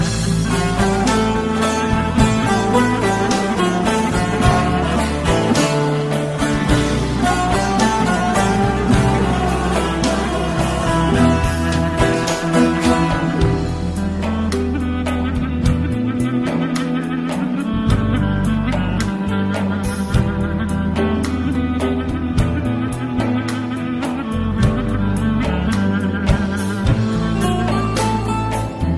Best Turkish tone

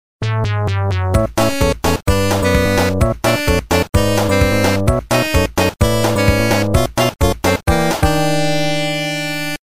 Continue Music

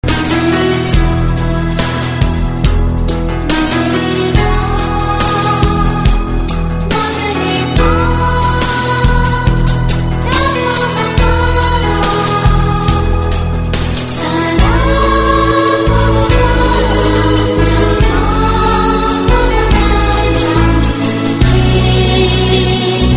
Romantic Tone

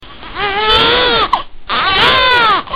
Baby cry

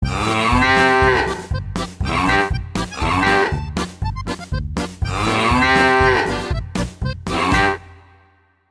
Bayrische Cow

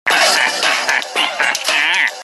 gnome laugh

